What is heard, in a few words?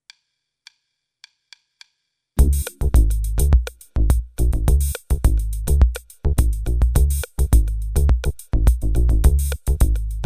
Drumboxes & Style